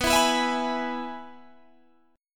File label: Minor Sharp 5th; Gsharp; B; 19,19,21,21,21,19